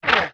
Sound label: cannon Ball; netent